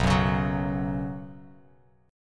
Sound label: B Suspended 2nd, Bsus2 chord, Bass Standard; Bsus2 strummed; 7,9,11,11; JGuitar